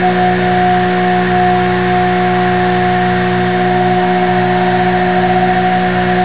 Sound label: dezir hi, electric